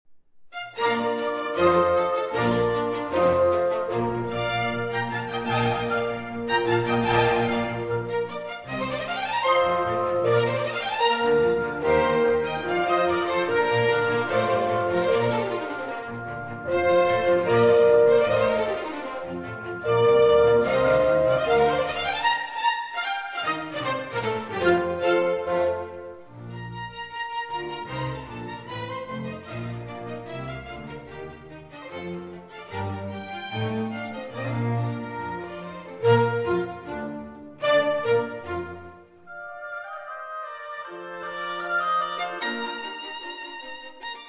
Concerto No. 1 in B-Flat Major, Presto; K. 207

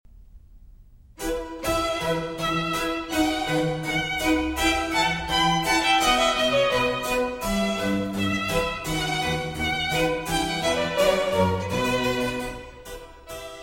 Trio Sonata in E minor for 2 Violins and Continuo

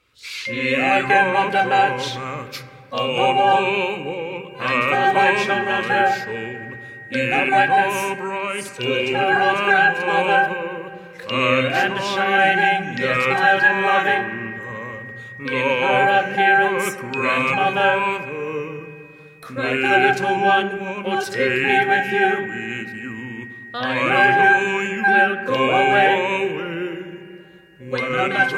4 Voices & Percussion; No. 12, She Again Rubbed a Matc

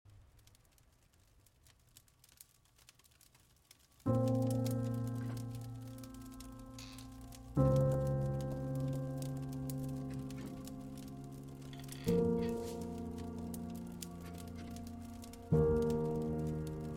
Piano Reworks

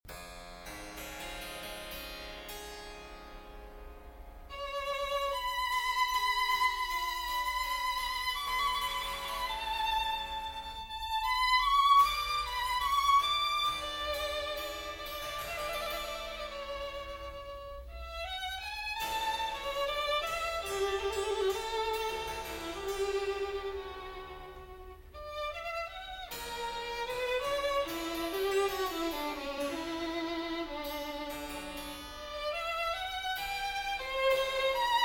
Sonata in A Major for Violin and Continuo

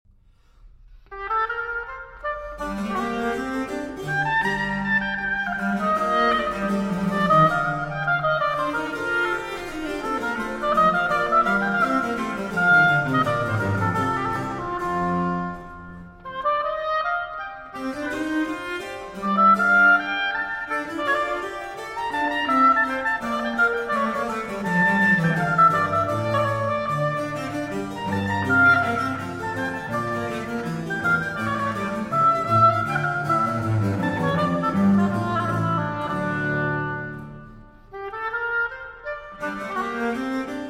Concerto No. 7 in G Minor, Allemande; Les goûts-réunis, ou nouveaux concerts; II. Allemande

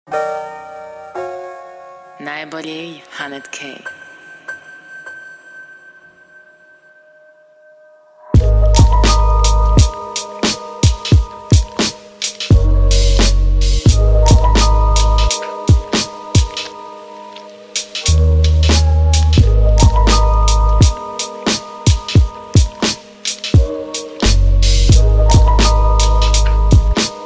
Chill Sax

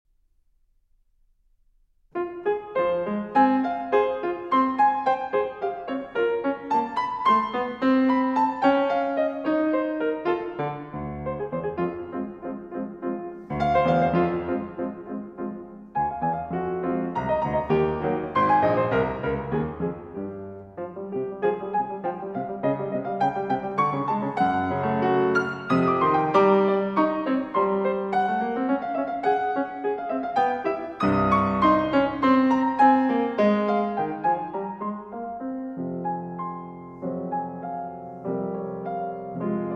Preludes, in F Minor. Allegretto

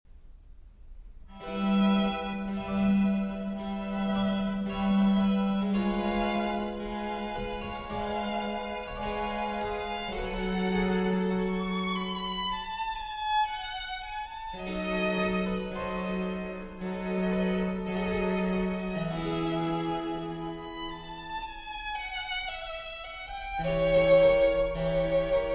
Trio Sonata in A flat for 2 Violins and Continuo

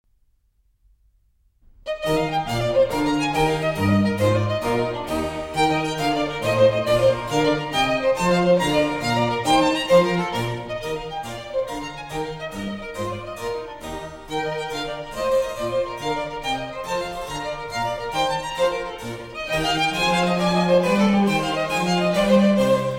Trio Sonata in E flat for 2 Violins and Continuo

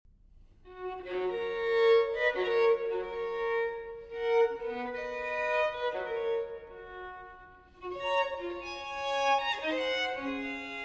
Solo Violin in E Minor Siciliana; TWV 4019; Telemann Fantasia

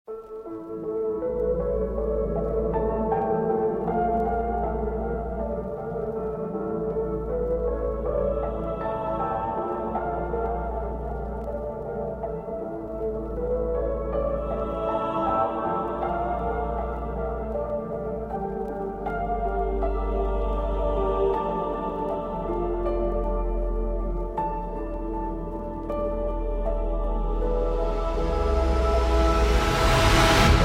Instrumental

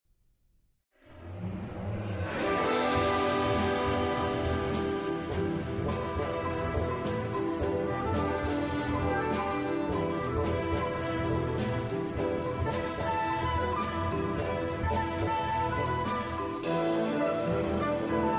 Concerto For Guitar and Orchestra

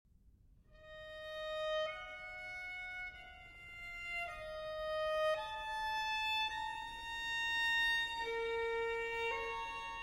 Solo Violin in E Minor Grave; TWV 4019; Telemann Fantasia

